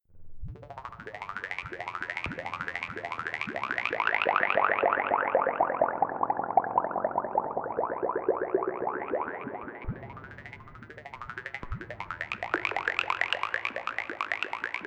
Modular

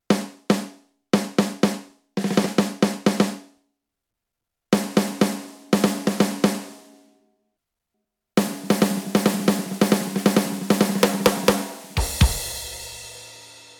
YAMAHA DTX6K3 SN muffled

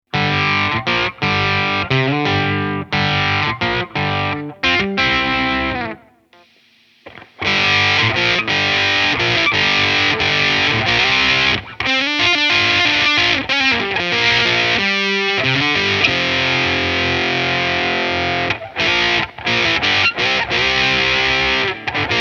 Zonk Machine Amp crunch FUZZ max.; British Pedal Company Compact Series

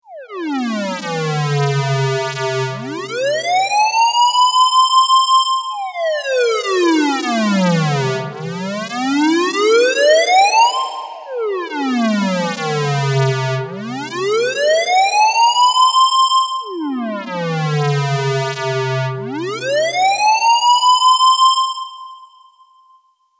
JX08 Portamento

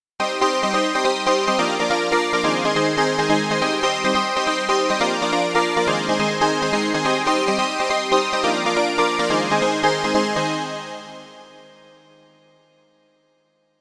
Keys K-Sation

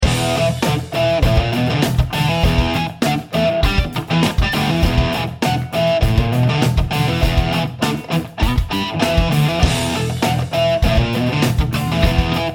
Crunch 6 string Bridge

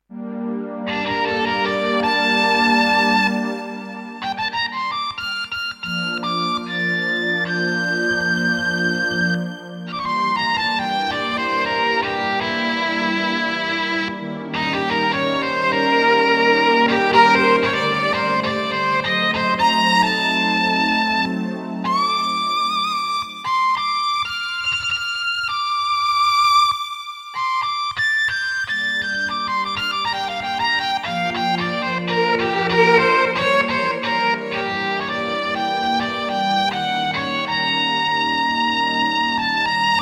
Violin Passion

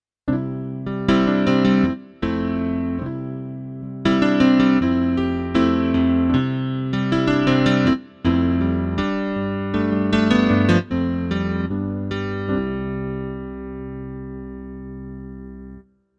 Arturia V Collection X CP-70

